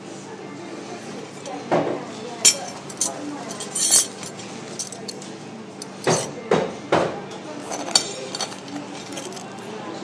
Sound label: cafe